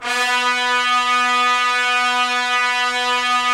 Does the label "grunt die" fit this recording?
No